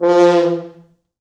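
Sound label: French Horns FX MOD; 4 FrHorns; East West; Quantum Leap Horns Sax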